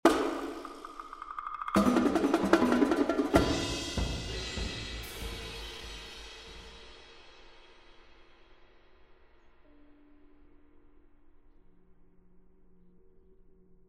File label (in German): Polyrhythmus